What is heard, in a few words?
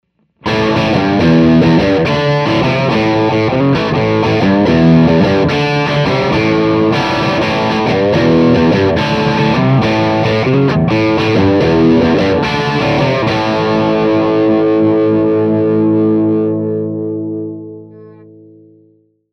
mid crunch